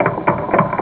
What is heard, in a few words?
wooden machine; Nokia; 5800 XpressMusic; Bounce Touch